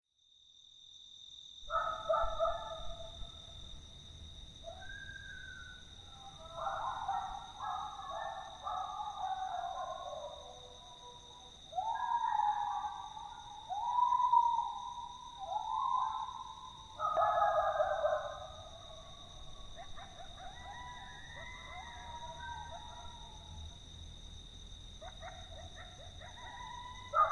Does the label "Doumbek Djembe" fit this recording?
No